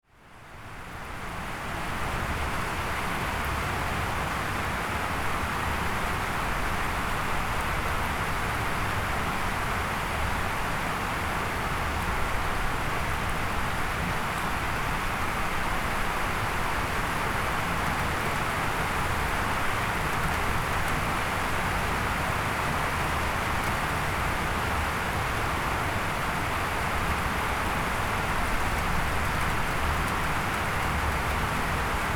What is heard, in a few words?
rain relaxing sound